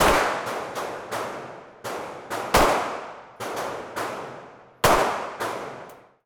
a real shoot out gun fire; nova

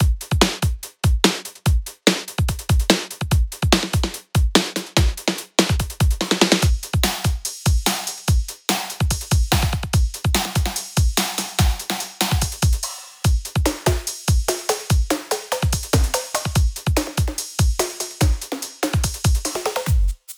drum; sn4re

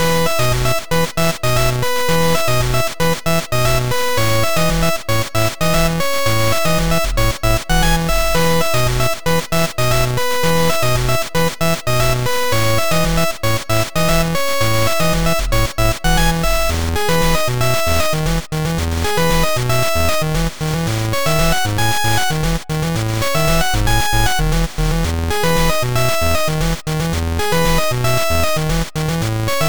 8-bit; retrobytes; adventure

